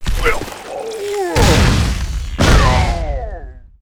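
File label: ARCANE scholar death, monster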